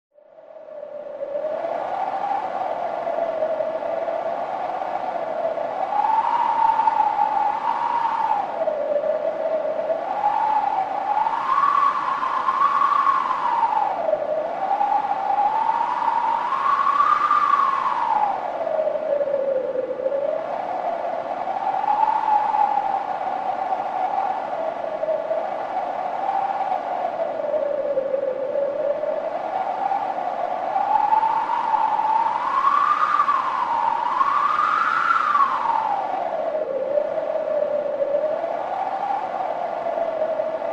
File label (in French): bruitage vent